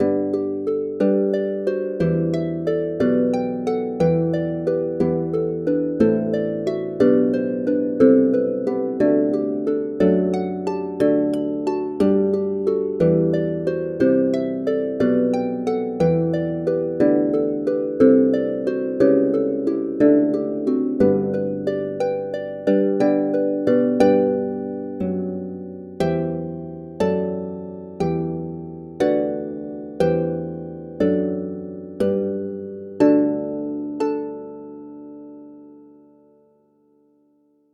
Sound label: harp